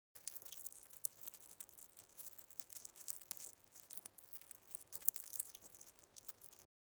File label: Bug Eating Plants